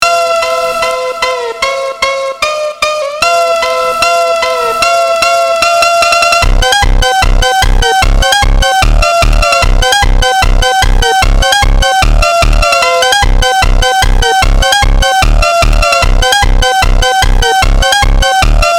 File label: phonk version